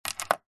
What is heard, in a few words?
foley watch metal set down table